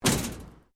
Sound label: Metal Plate Impact; Materials; Foundation